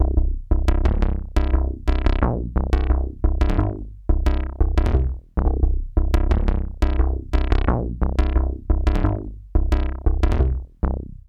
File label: BAZE, BASSLINES; Masterbits - Tekkno Trax